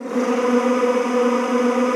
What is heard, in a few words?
B2-R, Extended Classical Choir; Best Service